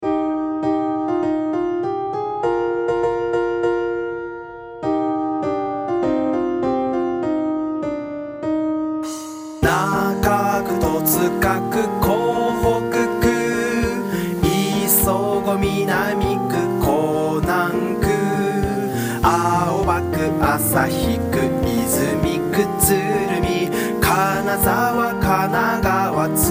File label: DRUM; 横浜18区を覚える歌